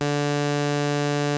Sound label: Prophet 5 D4, Synthesizer; Hollywood Edge - Giorgio Moroder Rare Synthesizer Collection